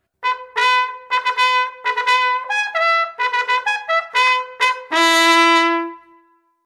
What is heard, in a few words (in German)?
Abruecken